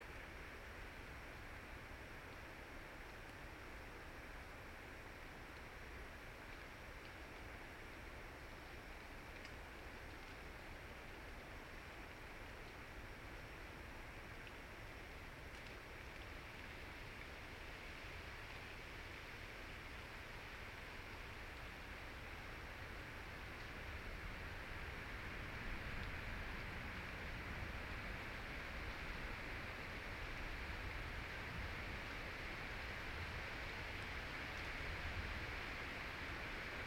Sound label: yamanakako sound